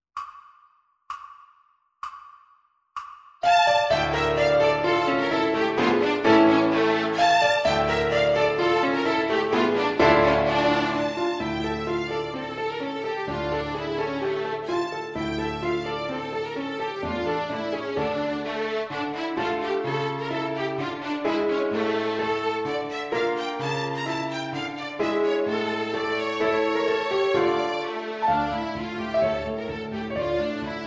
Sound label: Backing Track; Halling; Halvorsen; ViolinSchool